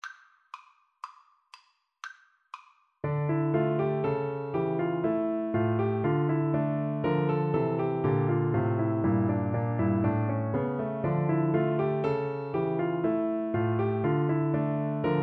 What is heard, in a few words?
gavotte, Cello; bach; bwv822